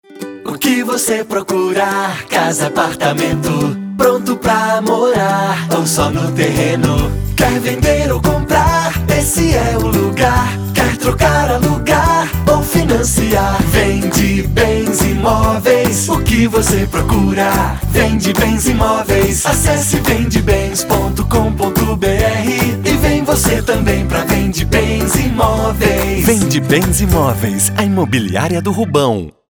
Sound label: jingle